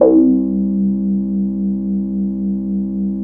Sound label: ODY bassics C2, vintage keyboards; Big Fish Audio - First Call Vintage Keyboards